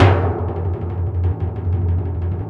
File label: PRC F SFZ, Orchestral Perc, Timp; Roland LCDP03; Ambo TimpRol